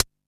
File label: Hat, Drum & Bass